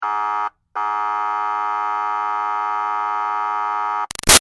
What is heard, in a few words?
Siren Police Buzzer Long; Emergency Vehicle Sound Effects; Big Room Sound